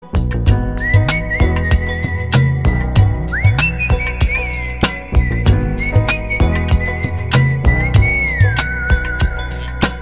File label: whistle